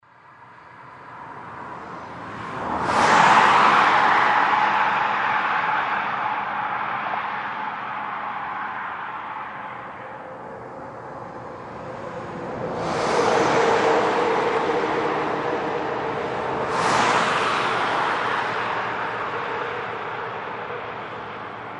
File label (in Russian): zvuk mashiny na shosse